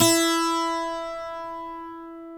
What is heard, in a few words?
E3 12-ST AC, AC.12-STRING; InVision Interactive; Lightware VOL-1; Instruments & Percussions